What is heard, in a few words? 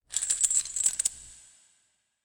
Chain wrapping Reverb